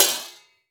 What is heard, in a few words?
Kopyak Mute; Cymbals